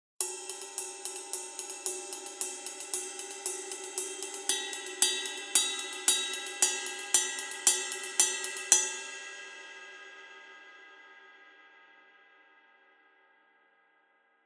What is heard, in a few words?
Custom Ride 22 Heavy